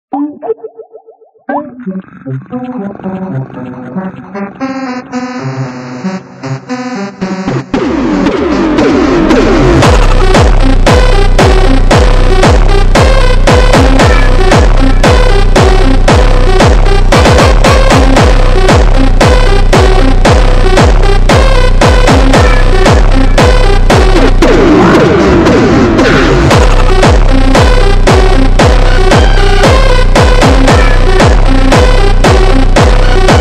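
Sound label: jumpstyle super Slowed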